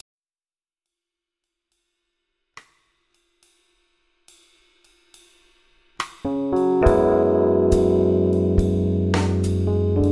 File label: suitcase no trem; MrRay73mkii